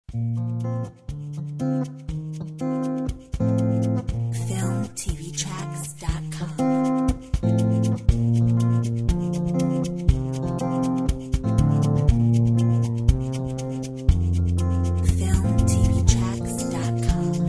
Rock; Filmtv-tracks

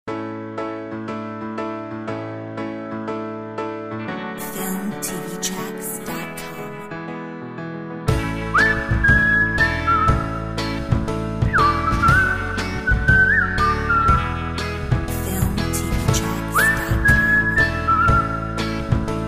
In the fields Whistle

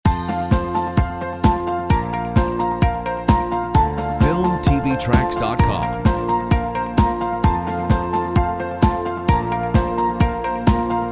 Bright energy

